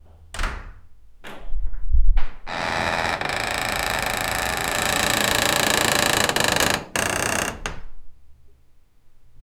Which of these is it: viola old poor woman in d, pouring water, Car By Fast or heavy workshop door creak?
heavy workshop door creak